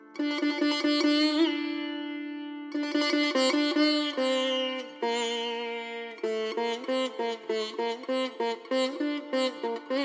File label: Sitar solo; raji an ancient epic